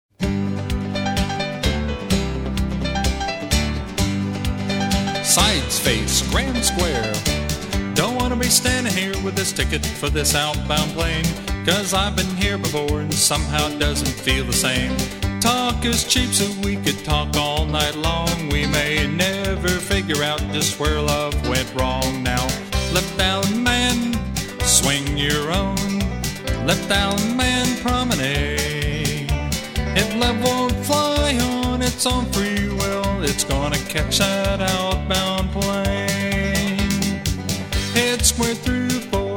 vocal, square dance music; cardinal

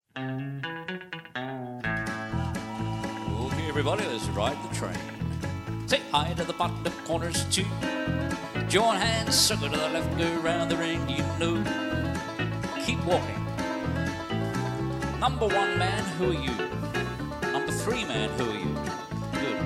vocal, square dance music

